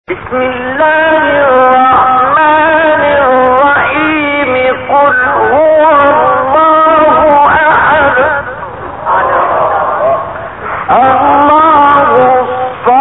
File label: quran